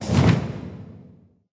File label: Elves Elite harassers Last breath